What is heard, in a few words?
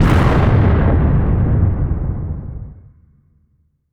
Explosion Medium, Barotrauma Weapons